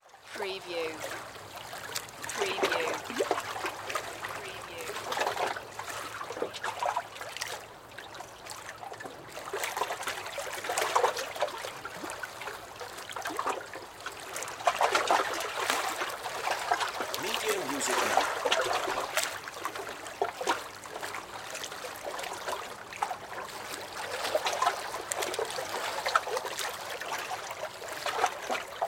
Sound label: Lake Dock Waves Splash; Sound Effects Bible